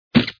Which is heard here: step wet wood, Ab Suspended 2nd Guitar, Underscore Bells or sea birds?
step wet wood